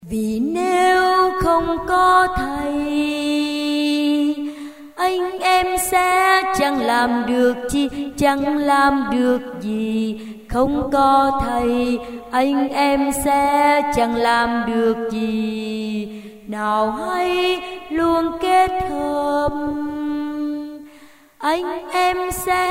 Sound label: basso